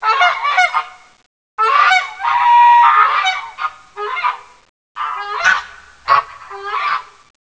ges

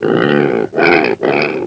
swinia chrum

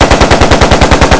karabin

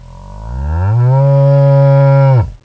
krowa

